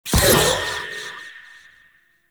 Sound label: Wraith Throw Loop End; Enemies; Warframe; Zariman